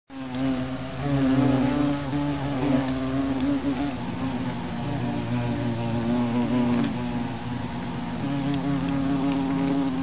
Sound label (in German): hornissen